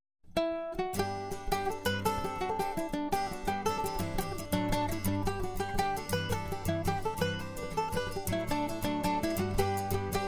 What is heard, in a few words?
Jig